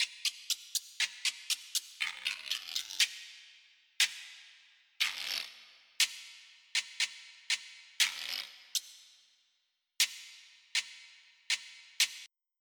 Guiro

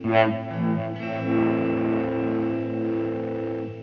ELEC GUIT ETHNO 125, Guitar; eJay

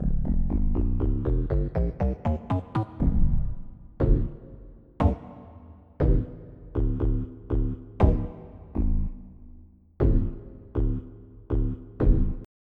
DarkBeats Kit; Onj's Soundfont

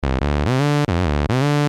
Moog Bass; cooledit

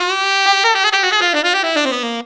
SAX C; songs; VST

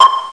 Doink

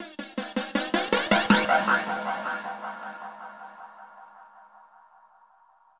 drum loop, ambient, 160; eJay

